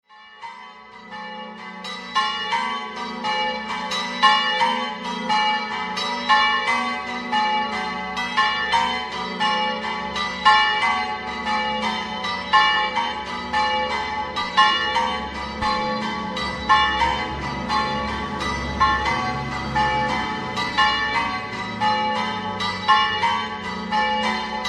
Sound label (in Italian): ROMANS di Varmo, campane